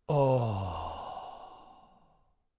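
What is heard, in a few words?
Sigh Breathy Oh male, Sigh, male, small; Sighing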